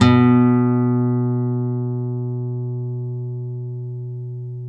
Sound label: NYLON F B1, GUITARS; Synclavier Sampler Library